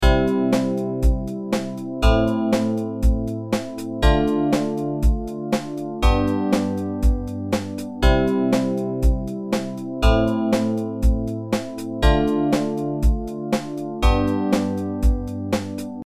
Am Dm G

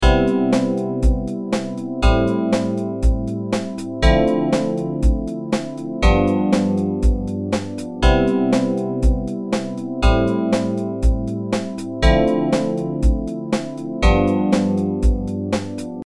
Cmaj7 Am7 Dm7 G7

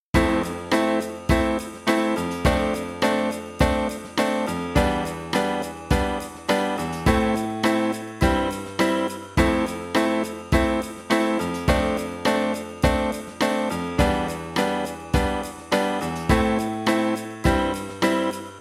Fma7 F6 Em7 Am Am7 G

